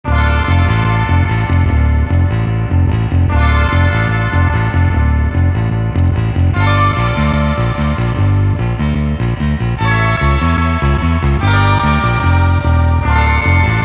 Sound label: 148bpm Surf Rock